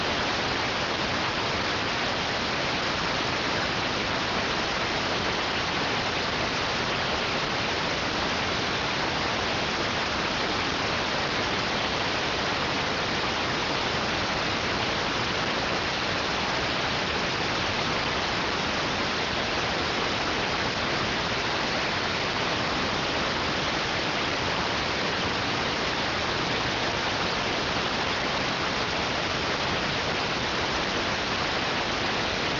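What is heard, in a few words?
radioteletype receive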